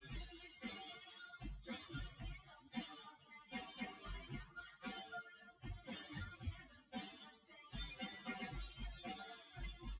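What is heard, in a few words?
with drums; synthconv 250ms 1m src; SiSEC08; SiSEC underdetermined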